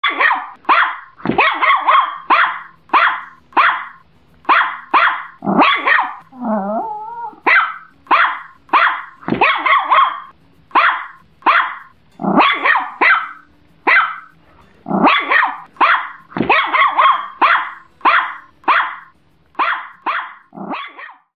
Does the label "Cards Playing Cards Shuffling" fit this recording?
No